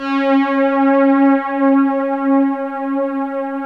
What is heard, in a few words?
SUPER JX STR, SYNTHS; Kid Nepro; Elite